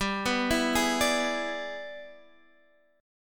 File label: E 7th G, guitar; E7/G arpeggiated; JGuitar